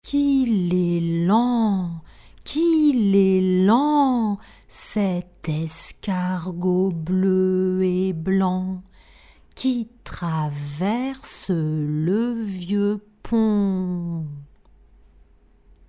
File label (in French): LENT; 1jour1geste